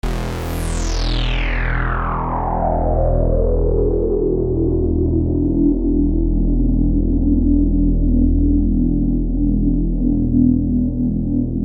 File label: Tom Sourer Sweep, synthesizers; Redshift 6